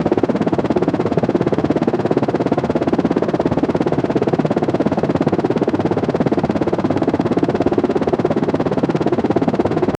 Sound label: helicopter rotor loop